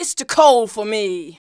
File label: VOX, Singin